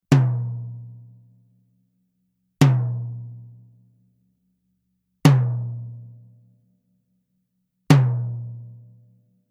TOM Reso Glass Hard

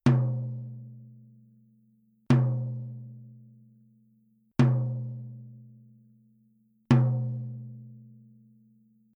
TOM G2 Coated Soft

